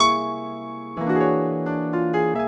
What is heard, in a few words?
Piano, Acoustic Pianos